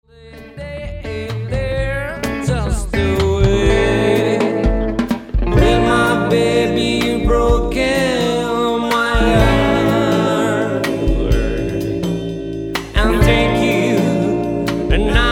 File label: Samba